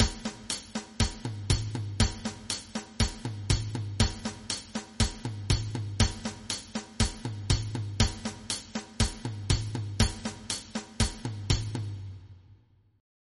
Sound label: beguine; Traditional Rhythms; Creating Rhythms